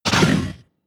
damage, Mudraptor

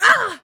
female damage; Human

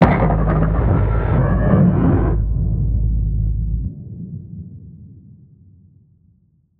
Alien Turret

